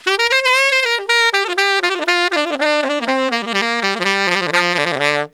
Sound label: SAX LICK, BRASS LICKS; Ueberschall; Jam Box